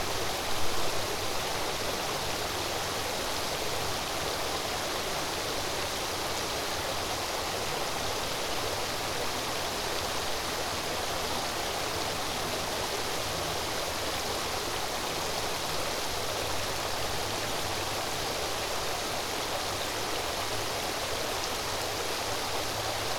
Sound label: Swimming Pool Night Street In Background